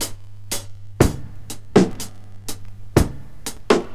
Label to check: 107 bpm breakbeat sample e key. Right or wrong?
Right